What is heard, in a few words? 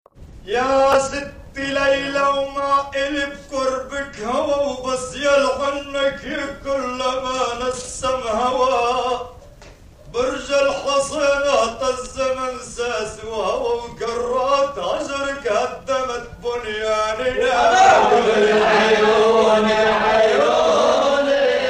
Lebanese Love Song; Folkways